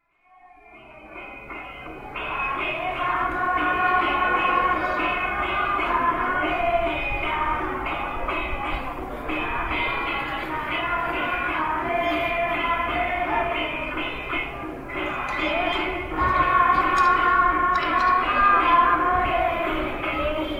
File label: bhajanashram ladies; radhakund